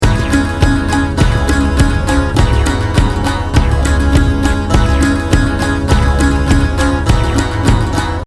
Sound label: ringtone